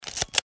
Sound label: camera load